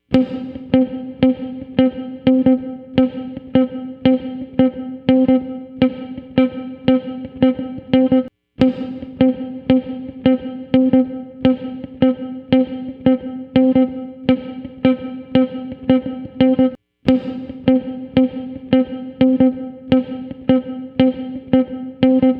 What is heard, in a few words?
Guitar